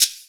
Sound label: Shaker; Equipped Music; Loungin House